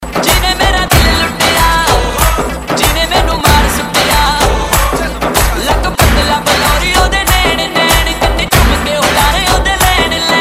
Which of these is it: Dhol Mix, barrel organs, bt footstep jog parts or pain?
Dhol Mix